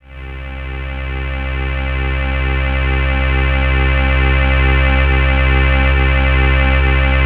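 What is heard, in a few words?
PAD C3 right; USB Soundscan vol.05 - Explosive Jungle; AKAI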